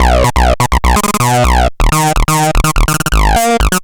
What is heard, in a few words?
Acid Bass; Sonic Foundry (Sony Creative Software); Underground UK House & Electro